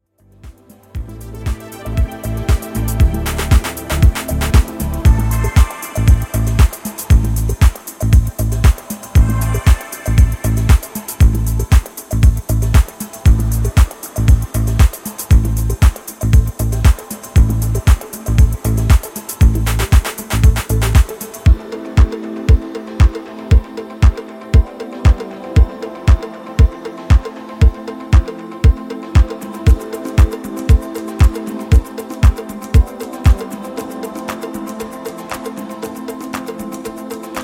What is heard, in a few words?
clarinets